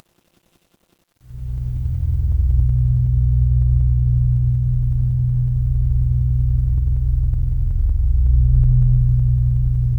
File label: ambience room tone